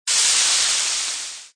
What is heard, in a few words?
Lava Hit